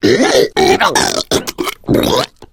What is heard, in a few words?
female boomer alert; voice; Left 4 Dead 2